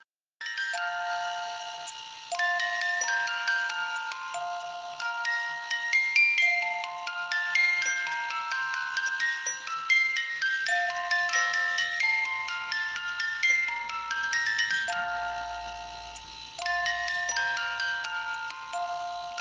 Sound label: mozarts lullaby